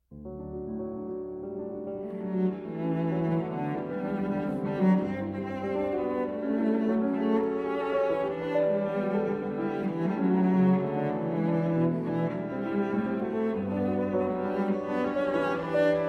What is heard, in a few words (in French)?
Sonate en Ré Mineur, Finale Allegro